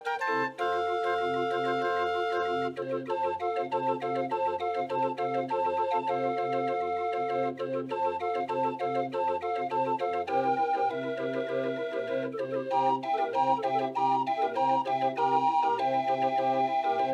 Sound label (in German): drehorgel musik schlager; drehoergelimarkus